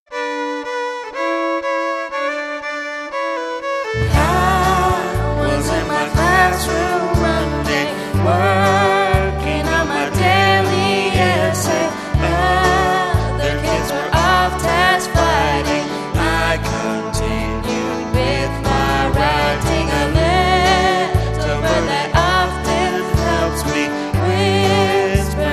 Vocal

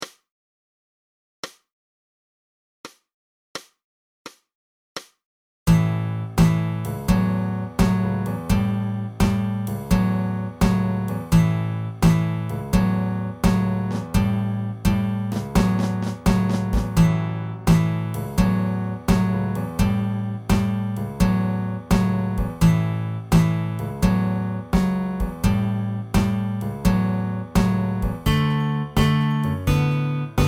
Blues Fingers

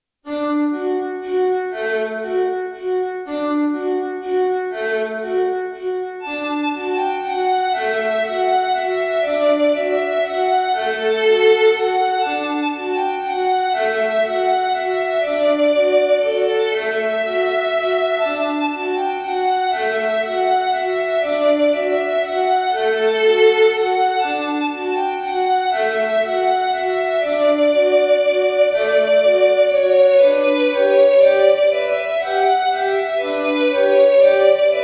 Violin Fun

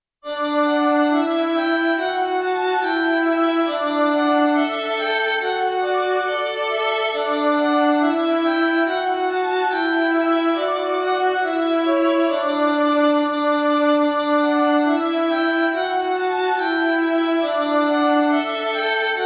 Violin Fun

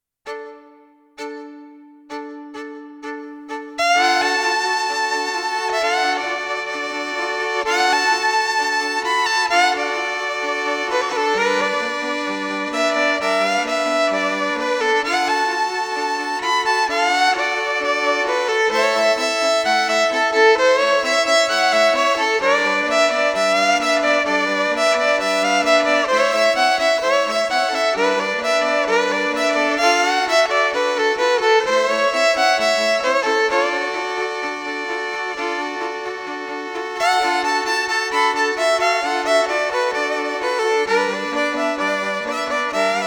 Blues All Parts

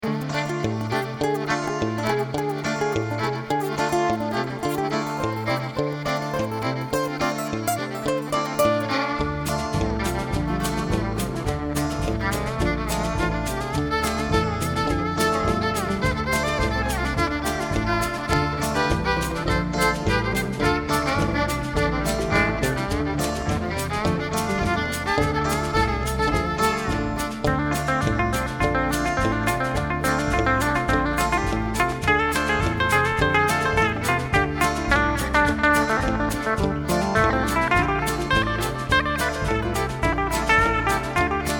Space Train Jam; ClassroomGrooves

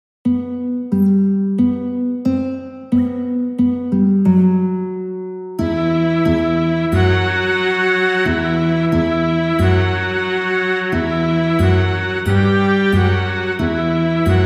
Sakura Strings

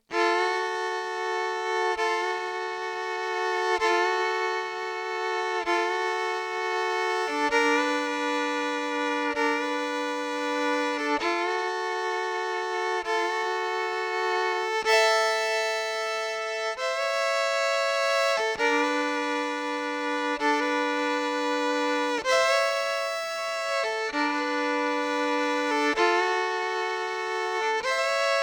Blues Mid Rhythm

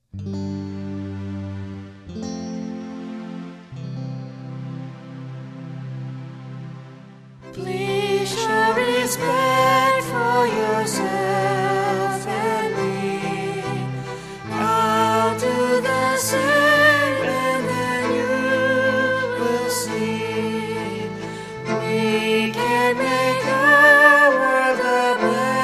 Vocal

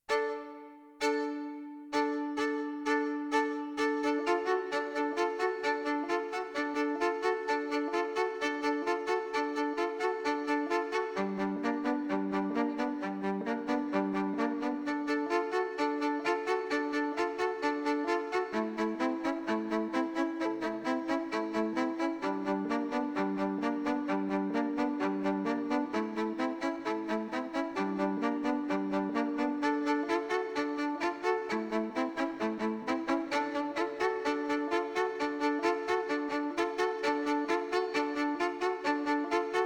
Blues Low Rhythm

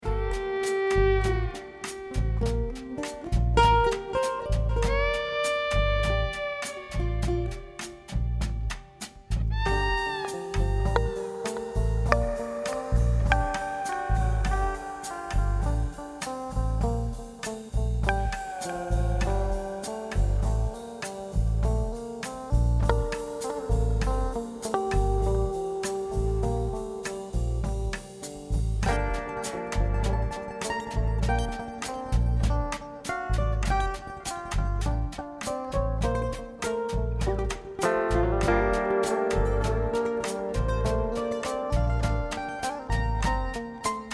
Space Bossa Groove; ClassroomGrooves

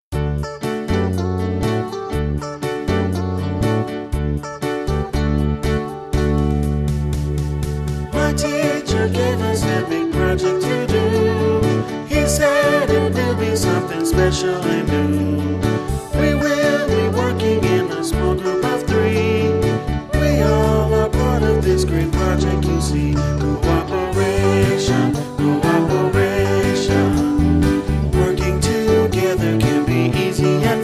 Cooperation Vocal

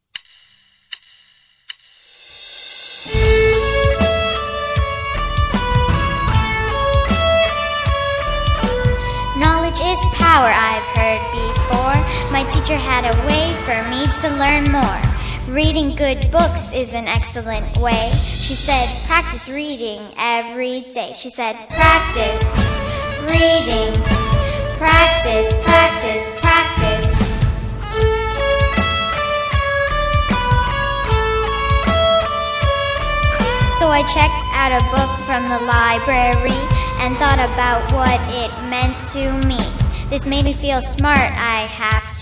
Practice Reading Vocal

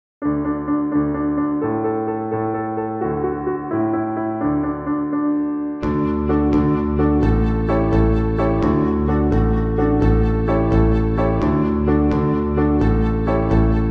Jig

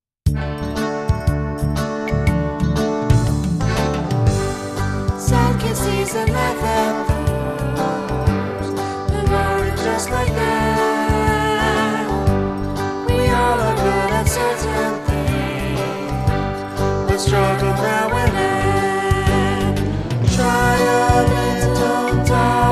Tolerance Vocal

